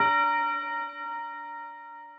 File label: BELL; osu